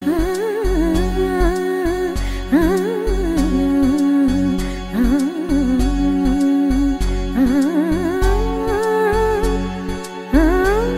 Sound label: Humming